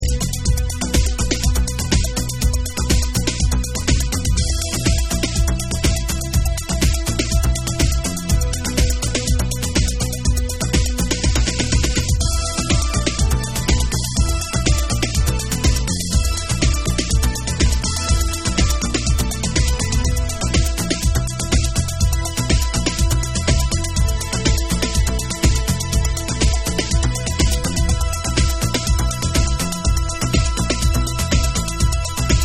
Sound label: Bass Dub